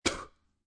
Spit Male Spitting, Human, Spit, Male Spitting Saliva Out Of Mouth